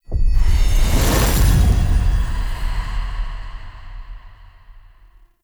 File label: Secret Room Unlocked; Doors; Puzzles